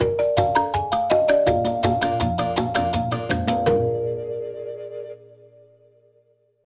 Minigame Scrat Lose

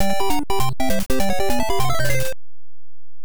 Miss; Mickey Mousecapade

